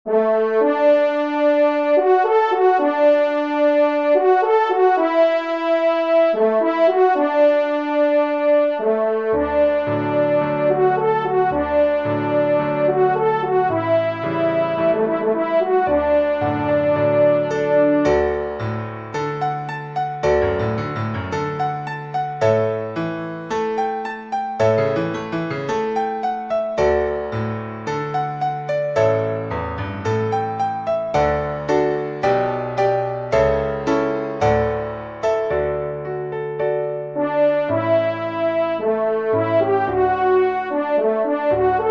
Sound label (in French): Trompe et Piano; Souvenirs Alpes Suisses